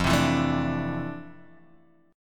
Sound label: F Major 13th, Guitar, Drop D